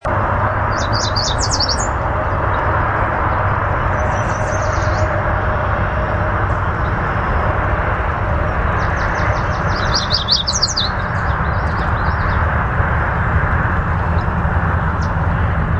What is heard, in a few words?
YWAR chip song